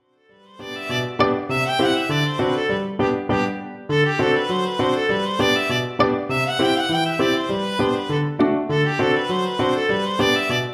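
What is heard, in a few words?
viola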